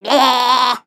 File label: Ribbit Frog Blah Sing; creatures organic